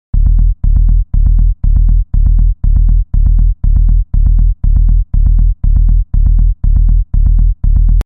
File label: Rolling Psytrance Bass